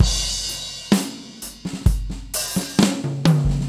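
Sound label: 65 bpm, beat loops; Zero-G; Monster Beats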